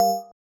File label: BELL